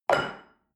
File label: shell upside down place on table hard short; berufe; gastronomie; soundpool